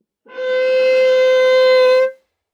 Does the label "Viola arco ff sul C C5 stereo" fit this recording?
Yes